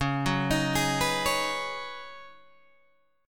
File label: C sharp 9th Suspended 4th C sharp down Guitar Open G, chord